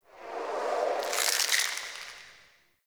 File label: Bones; Emissary Season Two; Zeal Pre Intro; Cinematics